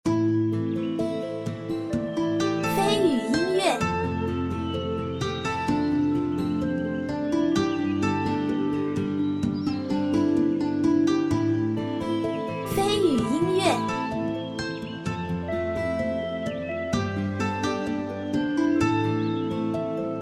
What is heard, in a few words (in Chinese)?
抒情-古风-安静